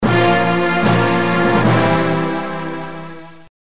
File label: Failed; Dexter's Laboratory Deesaster Strikes